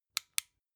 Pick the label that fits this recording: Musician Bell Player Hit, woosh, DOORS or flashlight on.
flashlight on